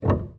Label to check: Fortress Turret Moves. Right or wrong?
Wrong